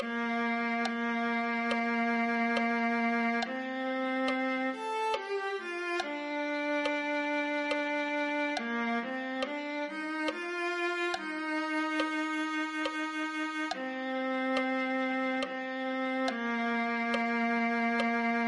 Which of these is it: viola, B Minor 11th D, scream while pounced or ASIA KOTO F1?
viola